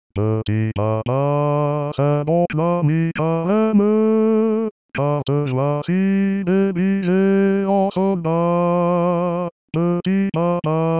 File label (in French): La Strasbourgeoise voix